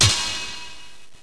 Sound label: Menu Command; Diablo; Desktop Themes